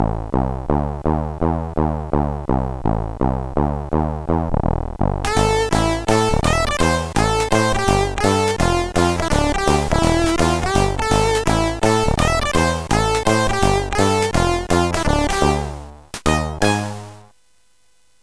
Jingles; CSG8580R5; hvsc

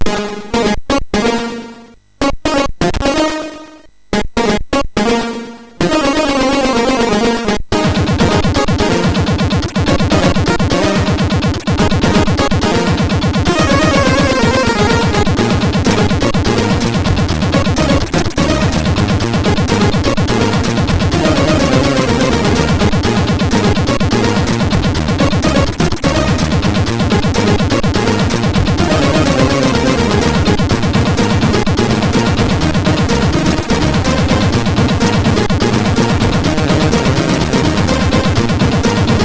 sid MOS6581R3, GAMES; Cisco Heat

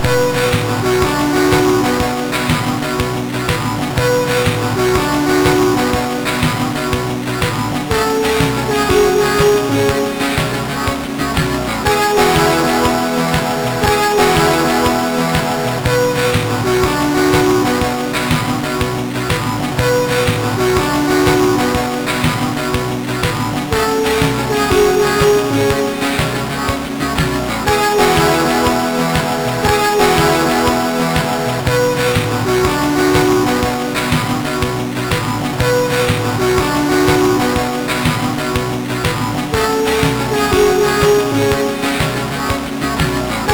sid MOS6581R2

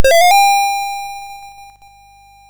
Buggy Boy, MOS6581R2

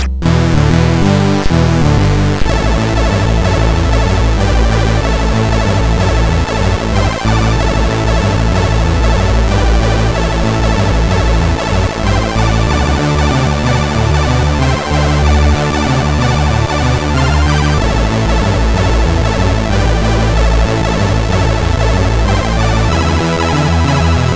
Short Tune, sid MOS6581R4; hvsc

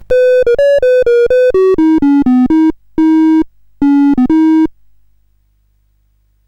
CSG8580R5, GAMES; Sammy Lightfoot

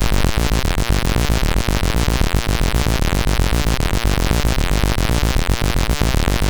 Project Firestart, MOS6581R4

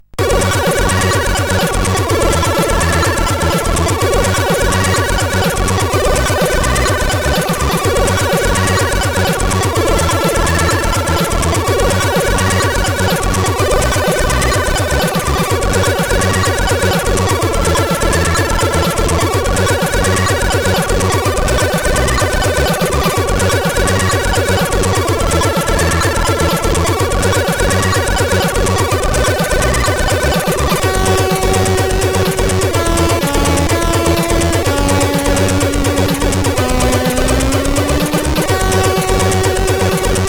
Megastyle tune, CSG8580R5; Sudden Death

